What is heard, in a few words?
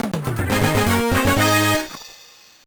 Unknown Jingle; Sonic Advance 2